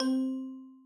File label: reward